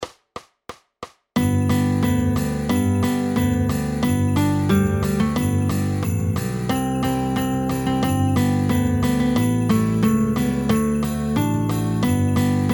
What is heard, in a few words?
Christmas Jingle Bells